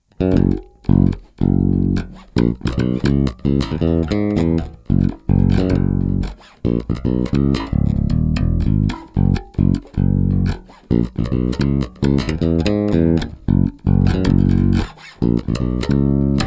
Funk Mix, basses; Hybrid 5

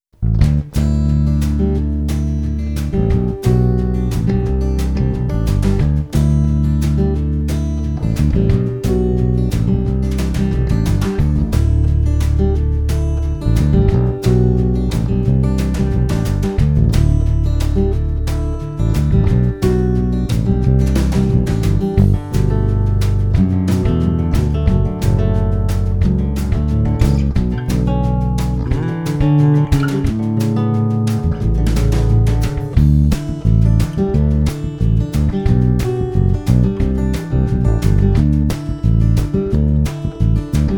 Country Mix, basses

